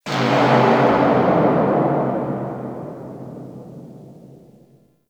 Factory Thud; Ambience; Warframe; TheNewWar; Zariman

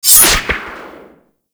Light Glaive Throw; Warframe

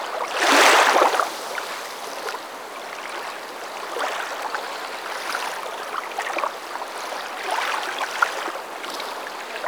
Beach Soft Waves Loop; Warframe; Grineer Beach

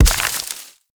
Mech Step Surface Foliage; Vehicles; Step Surfaces; Warframe; Nechro Mech

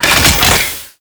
Thumper Rocket Cannon Extend; Warframe; Grineer

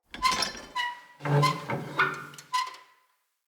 Light Mirror Hang Squeak; Puzzles

